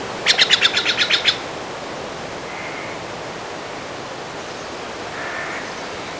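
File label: background music; Virtual Graceland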